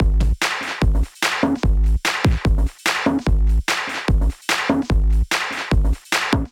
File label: Kit Melodic 147 bpm, Drums, Complex; MIDI Clips; Night Shade Kit; Ableton; Factory Packs; Skitter and Step